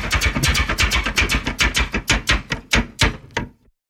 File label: Minecart Move